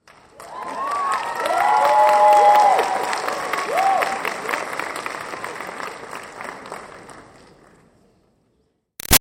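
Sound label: Crowd Applause Cheers Audience Graduation Light; Auditorium; Human; Big Room Sound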